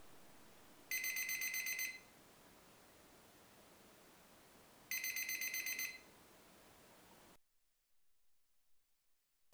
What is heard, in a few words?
ME66; Microphone Input Noise Comparison